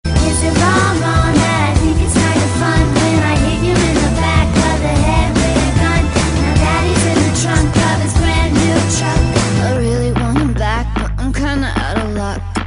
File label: Metal